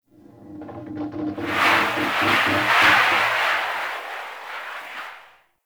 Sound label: Void Storm Wind Gust; Warframe